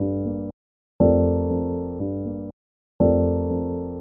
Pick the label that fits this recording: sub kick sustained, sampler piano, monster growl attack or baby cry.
sampler piano